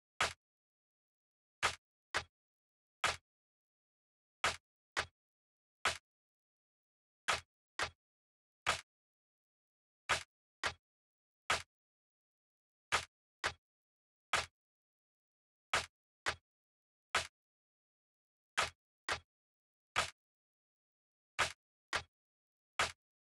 claps; SiSEC11

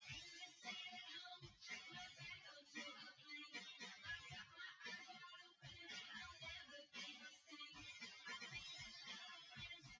wdrums synthconv 250ms 5cm src; SiSEC08; SiSEC underdetermined

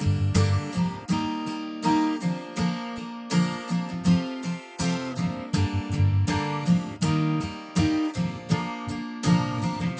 nodrums inst sim; SiSEC10; underdetermined

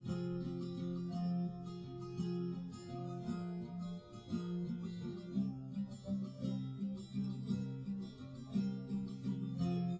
no drums live rec 1m sim; SASSEC07

